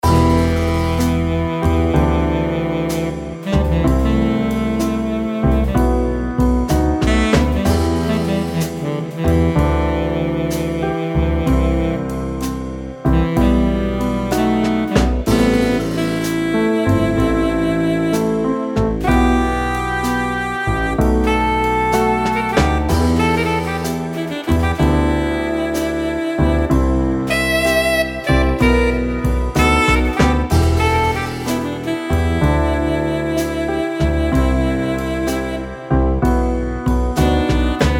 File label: Sexy Sax Blues